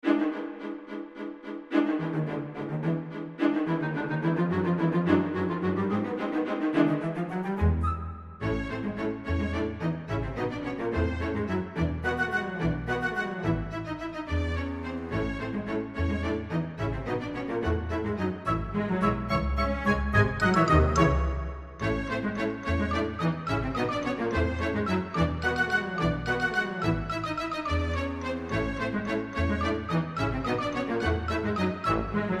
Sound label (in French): valse folle; Romantique classique victoire